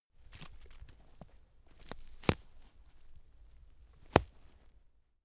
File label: step on twigs